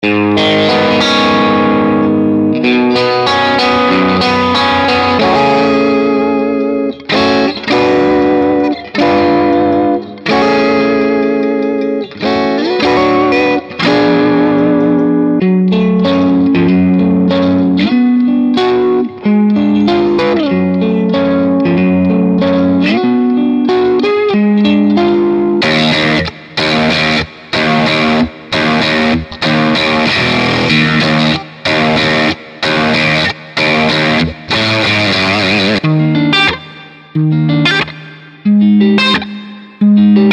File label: Hip Hop Guitar Riffs